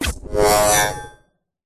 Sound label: robotic switch scanner sci fi robotic robot cyber futuristic energy machine drone